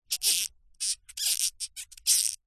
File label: plastic small strap movement squeak creak; foley; zapsplat